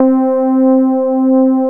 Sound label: DX soft pad